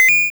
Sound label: Money; glitch in the system; godot 4